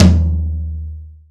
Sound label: high tome drum sample d sharp key